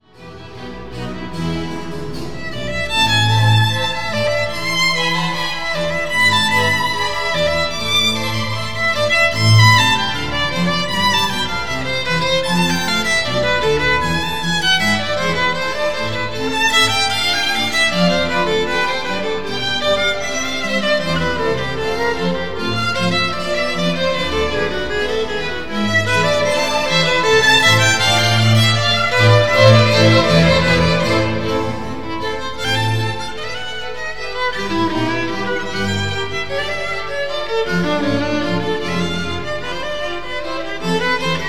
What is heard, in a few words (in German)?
Violinkonzert a-moll BWV 1041; Johann Sebastion Bach